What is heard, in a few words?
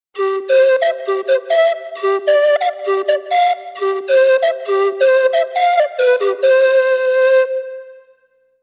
Quenax; TURBO VL